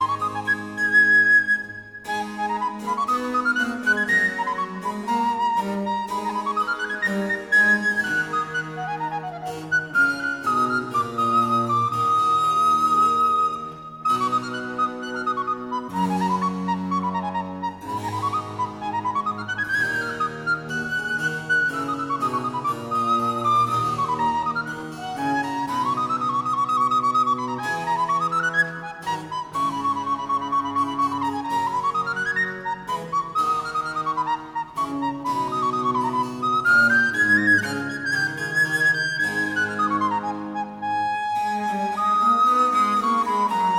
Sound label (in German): sopranblockfloete; drei stuecke unbekannter meister aus tuitnement kabinet